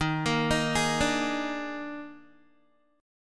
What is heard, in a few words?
Eb Major 7th Suspended 4th Eb, Guitar; EbM7sus4; arpeggiated